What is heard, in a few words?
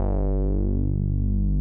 Bass Hit